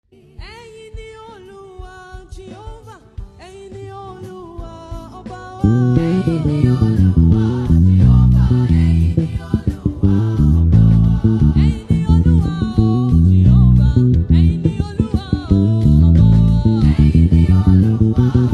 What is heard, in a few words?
Bass Cover; Bass Lady